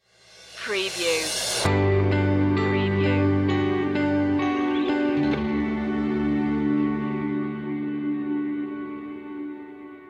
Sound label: drifting stinger